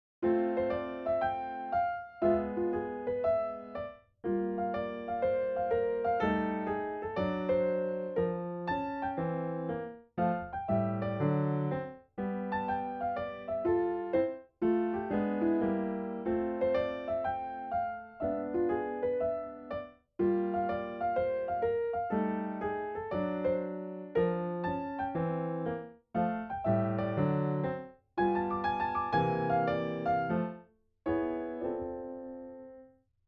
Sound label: jazz